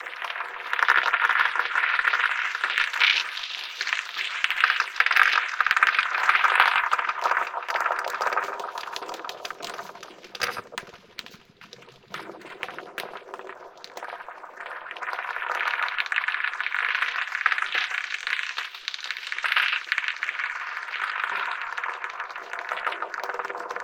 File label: Cosmic Fire